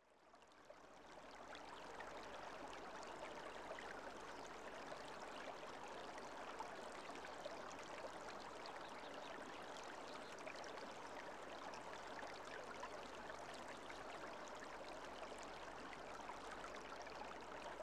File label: river gentle bubbling